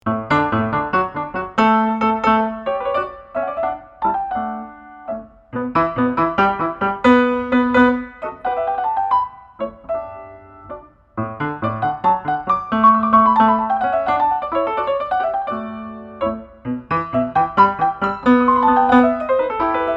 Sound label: Sonata in D major Allegro